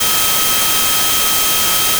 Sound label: additive voice single; euclidean; FFTimbre